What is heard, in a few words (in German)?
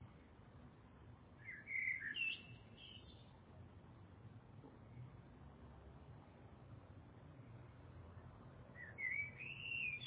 vogelgezwitscher lang